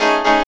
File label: P. CHORDS, KEYS